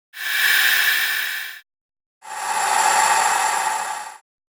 Breathing

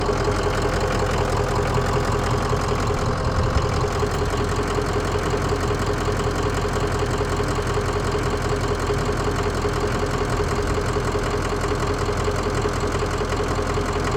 Diesel Idle

